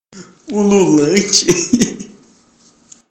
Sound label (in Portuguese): ululante